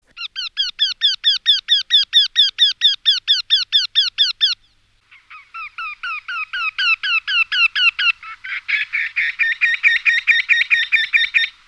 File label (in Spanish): Torcecuello, pajaros